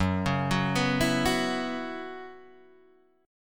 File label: F sharp 7th Suspended 4th, Guitar, DADGAD